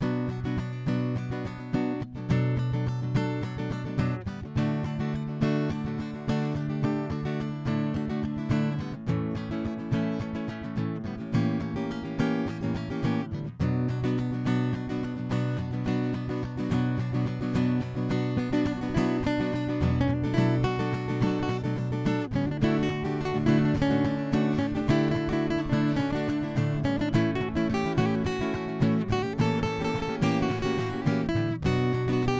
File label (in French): latino accords et impro